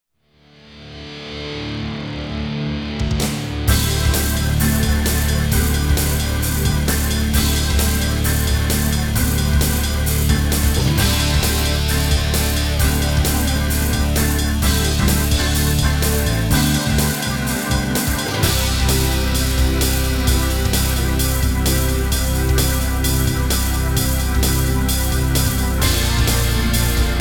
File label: winner; 1080 Snowboarding